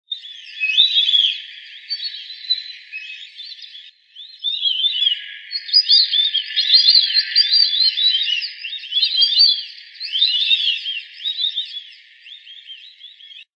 Pato siriri pampa

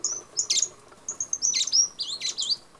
Ruidos del monte, Tacuarita Azul; Santurce; Santa Fe

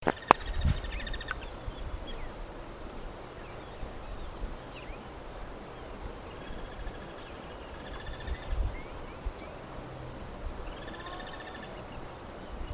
pirincho

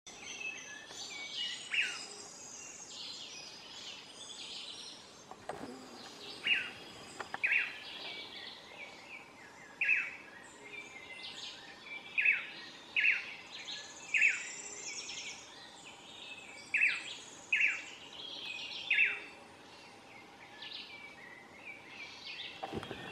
bailarin canto

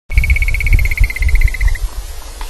Melanopareia maximiliani canto; Salsipuedes

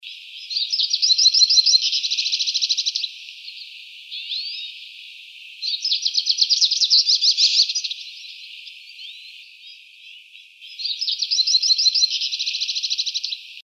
Cabecita negra; Cabecitanegra Común (Spinus magellanicus)

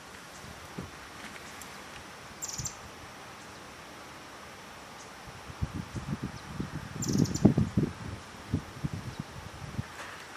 Piojito Trinador

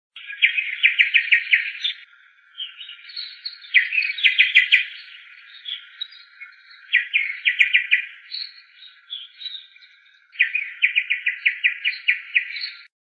Anambe comun, Caneleiro-preto (Pachyramphus polychopterus)

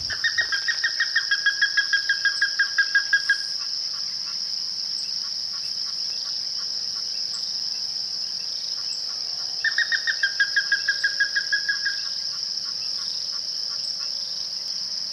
Bandurria Mora; Chajari; calle Lagni

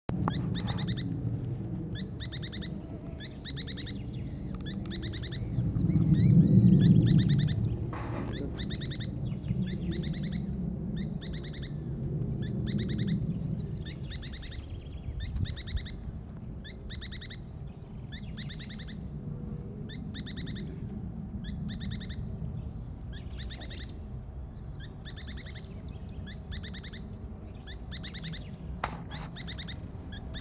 Pijui Plomizo

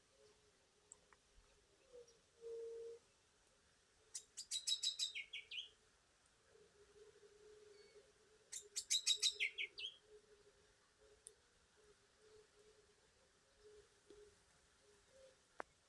Pepitero gris, Saltator coerulescens

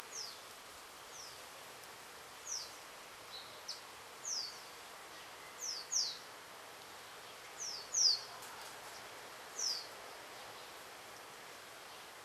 Golondrina Barranquera; Chajari

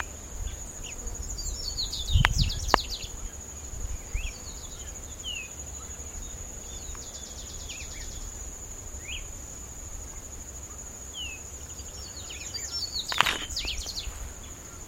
Corbatita; Corbatita Común (Sporophila caerulescens)

